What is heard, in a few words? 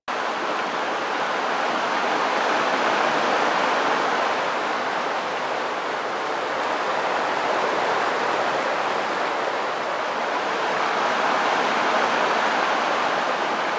Forest River Huge Distant; Ambience; Props; Warframe; Grineer Forest